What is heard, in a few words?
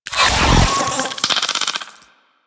Sentient Mimic Unfold; Warframe; DE; Sentients; Sentient Trooper